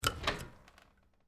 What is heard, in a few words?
Door Open French, Foley, Door, Open, French, Door; Miscellaneous Doors; BlastWave